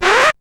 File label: needle; SoundFactory; SMPMANIA; EFFECTS